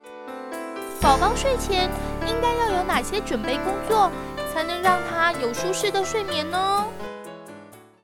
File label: Baby